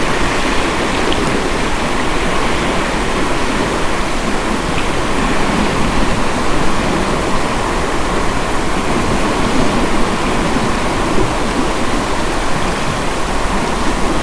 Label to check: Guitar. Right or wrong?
Wrong